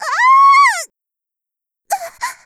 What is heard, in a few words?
die; Eternal Return; Tia; Voice Line; KOR